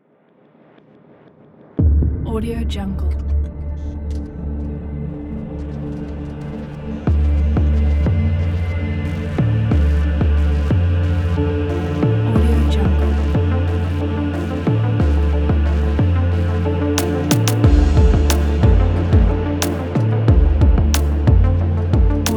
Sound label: automotive cinematic hitech ident